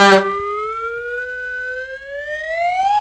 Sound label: honk and slow ascending whistle